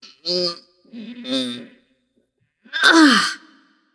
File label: nv grunt lift; smite; voicepack; morgan le fay